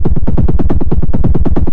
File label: 139 rolling minimal techno; SYNTH AND ELECTRONIC LOOPS